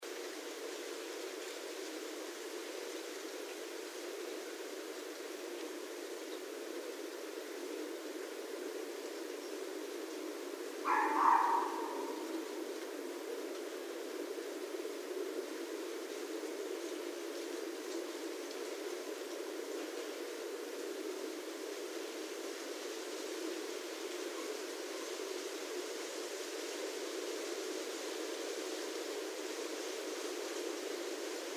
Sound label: Red Fox